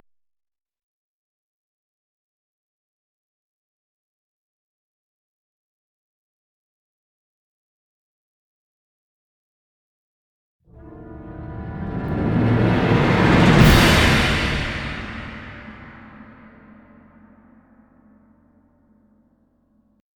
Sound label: Giant Death; The Witcher 3 Wild Hunt